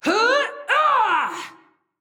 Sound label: Grunt Physical; Mage; Seraphim; Realm Royale